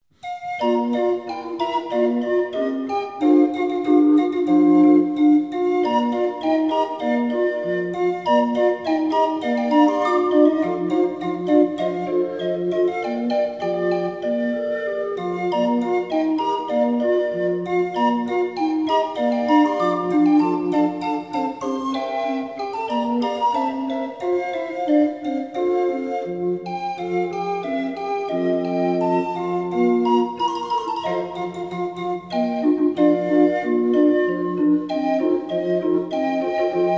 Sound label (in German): 20er Drehorgel